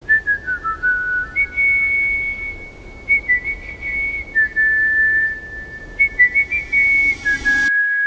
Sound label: Idle Whistle